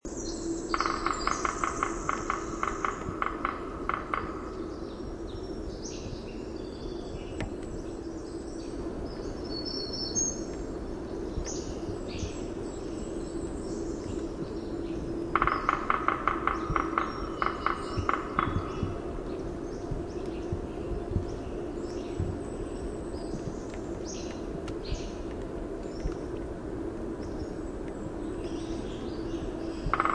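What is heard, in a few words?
Red-breasted Sapsucker drumming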